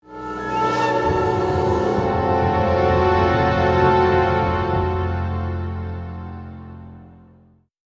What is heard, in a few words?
Play Stinger; Azalina